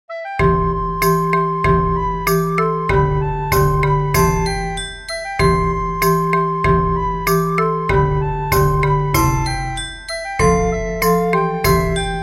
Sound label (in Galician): Conxunto Instrumental; San Xoán; Unha peza para cada festa